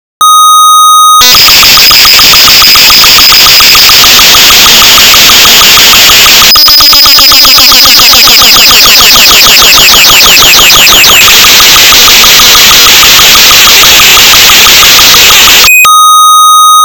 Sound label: grids groove; patterns